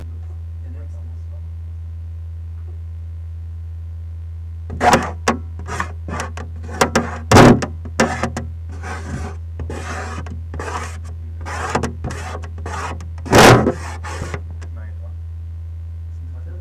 plaste finger

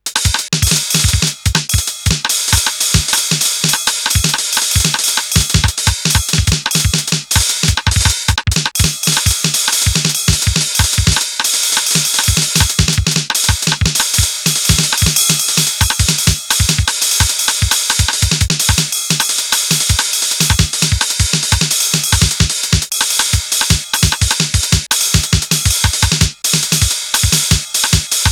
noisebeats tr707

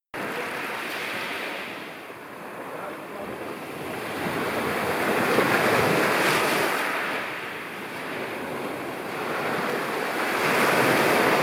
Playa Forti; Original Video Ton; Curacao; Fieldrecordings

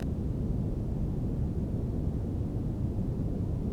noisebeat bass; Samples Rauschen

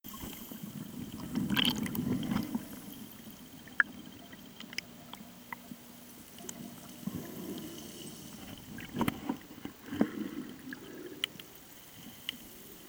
Playa Kenepa Grandi; Original Video Ton; Curacao; Fieldrecordings

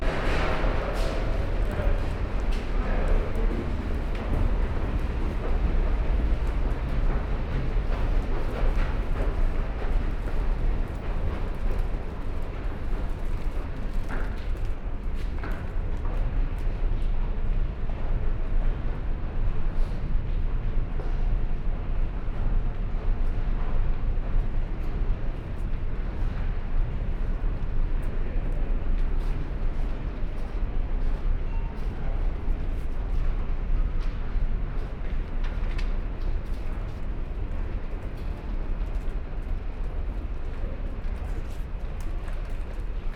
Tube, London; Walkman Performance

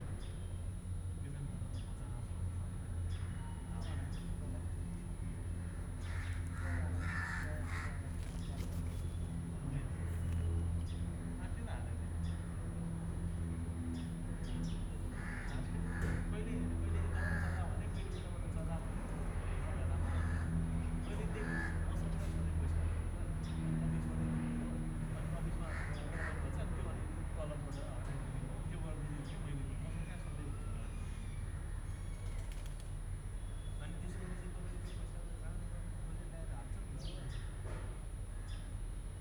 Rokpa Cafe, fieldrecording, Kathmandu, Nepal; Boudha

